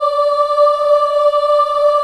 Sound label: D4 CHILD OH, Choir; USB Soundscan vol.28; Acoustic & Synth; AKAI